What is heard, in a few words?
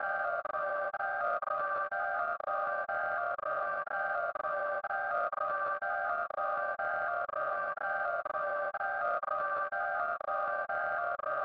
123 BPM G Min Pitched Pad; Melody; Loop Stems; God Fearing